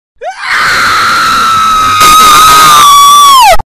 urlo disumano effetto sonoro scary

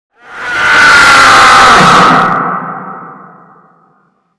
urlo spaventoso effetto sonoro scream

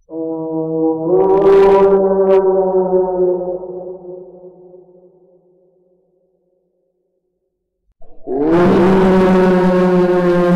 suoni horror effetti sonori inquietanti suoni paurosi scary sound

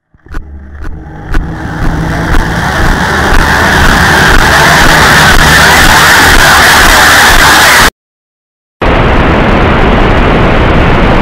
suoni che fanno paura suoni horror

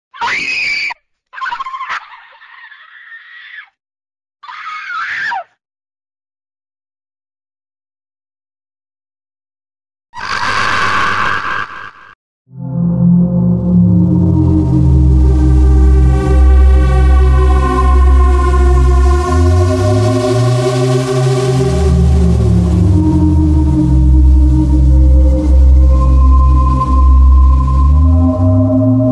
suoni horror suoni inquietanti e spaventosi effetti sonori scary sounds

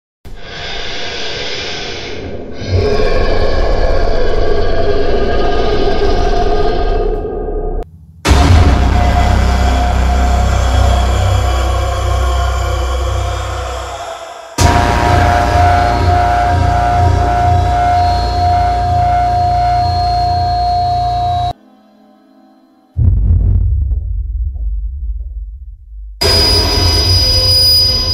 suoni horror da pelle d oca brividi horror suoni spaventosi sound effect; sound effect horror hd sound

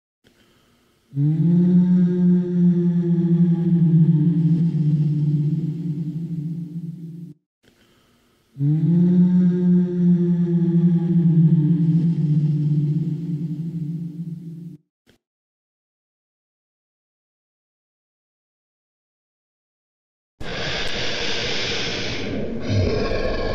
sound effetti sonori spaventosi suoni horror e urla a squarciagola; bhoot sound; sound effect horror

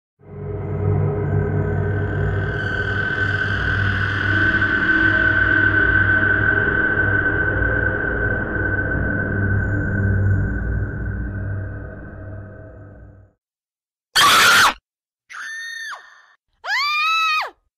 effetti sonori urla strazianti e suoni horror terrificanti

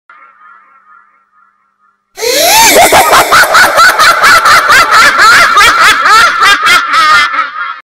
risata inquietante, creepy

